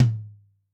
TOM, Yamaha DTXpress II

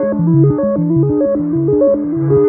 Arp Swingin Arp, Guitartronics; Sonic Foundry (Sony Creative Software); Ma Ja Le Ethereal Textures

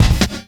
Kick Snare; Esoterik Beatz; Saver Kit

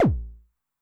BD MOD [Pitch+Depth], 909mod, BASS DRUM

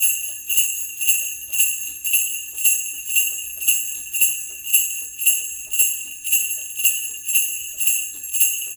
BELLS, Loops; East West

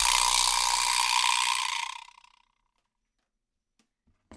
vibra slap; Sonic Foundry (Sony Creative Software); Joe Vitale Latin Percussion

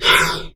BREATH, HEAVY BREATH; Q Up Arts; Denny Jaeger Private Collection

